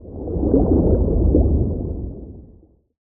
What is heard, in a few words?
Blubb; Barotraumatic; Hornshrimp